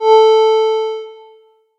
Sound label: pa Alert Reverb; SnootGame